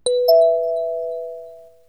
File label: Notify; LHR